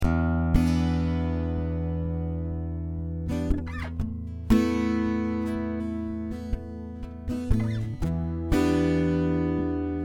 Twelve string Improv

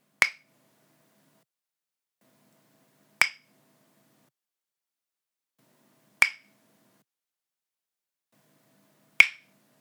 Five-Four Guitar Groove

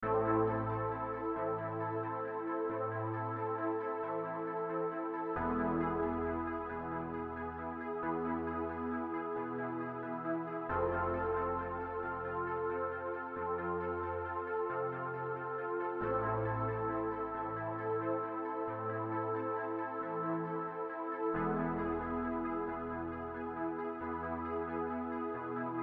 Am E F Synth

